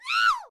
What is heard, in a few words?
Valkyrie Attack Vocals